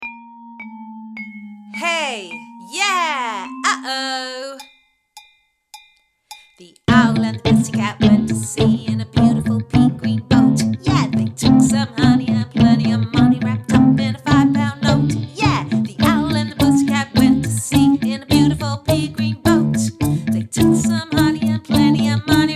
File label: Chest voice speech quality guide